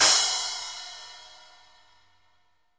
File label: Z12 Splash A3 Stk1 ff; REAL LIVE KITS